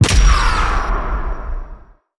gold explo

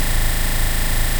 skill chainsaw extension loop

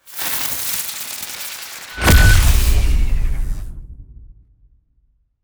ui level up

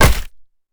rod

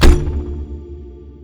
imp cello

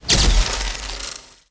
atk live burst

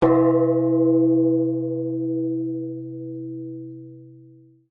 large bell

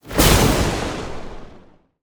lightning magic

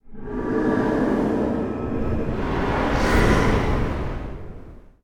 phantom magic

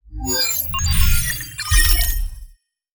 ui settlement succeed

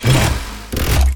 car crash

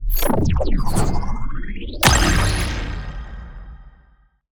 ui difficult settlement

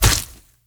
burin

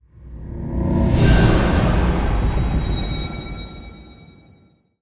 system start weird

